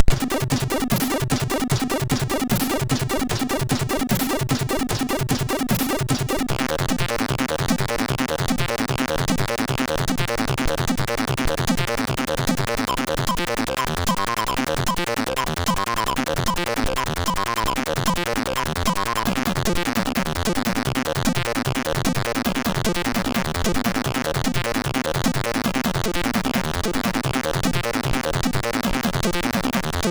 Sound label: MOS6581